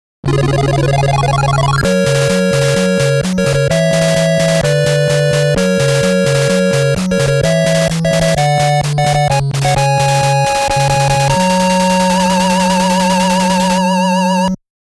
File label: All Stage Clear